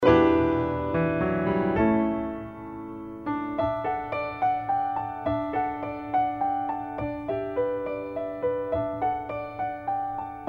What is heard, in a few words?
longing for spring instrumental